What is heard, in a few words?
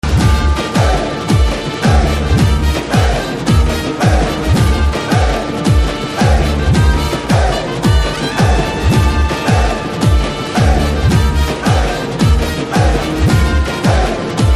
Anthem BGM Telugu